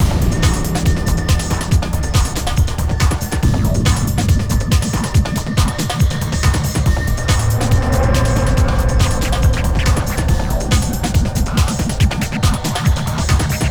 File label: Aether High Loop; Unreal II The Awakening; Acheron